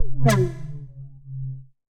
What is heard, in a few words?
Suckit Effect; Effects